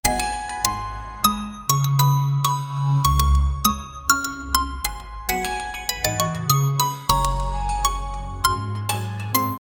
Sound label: playfull melody halloween